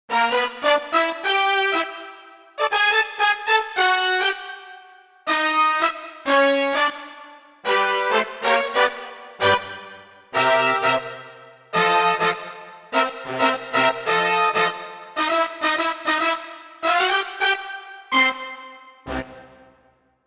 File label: Brass Sect, Roland JV-880; Factory Patches